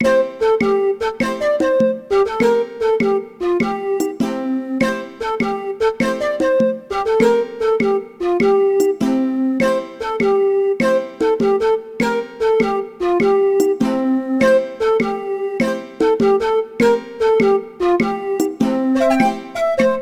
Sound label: Sea shanty; Ultima